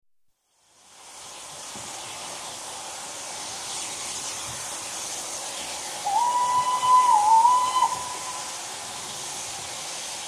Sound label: Steam Train departs from station, left to right (long fade to distance); BBC